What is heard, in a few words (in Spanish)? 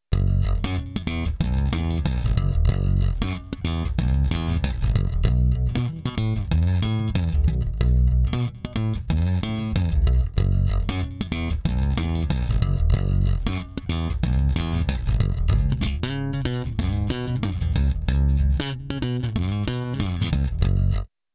bajo